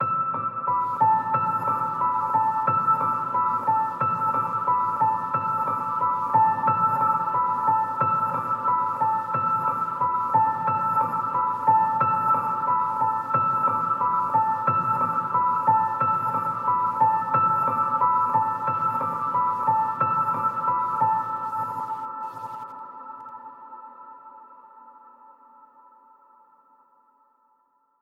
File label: Piano - A 90BPM; STEMS; Ghosthack; SkyRealm; Mood Kits; Mood Kit 02 - Afterlife